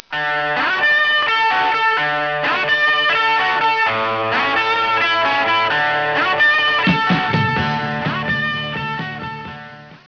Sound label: BEND